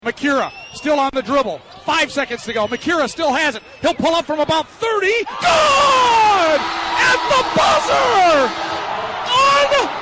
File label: buzzer beater; lakeville